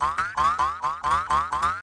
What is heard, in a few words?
Grunt